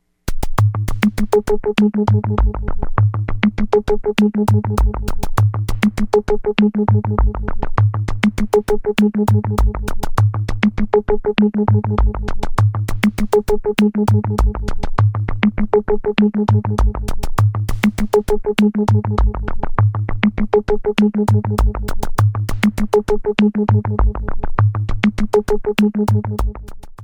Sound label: rock on